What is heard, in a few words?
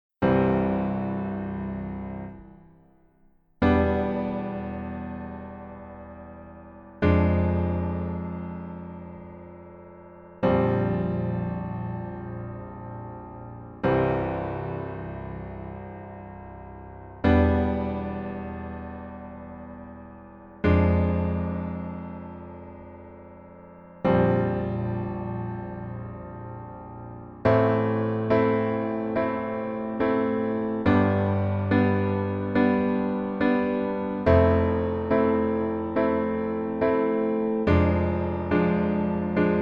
PIano ver